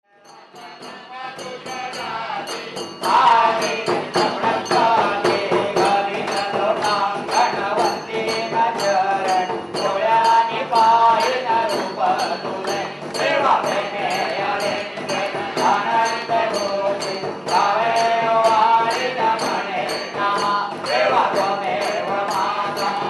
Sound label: sidhivinayak, sounds of mumbai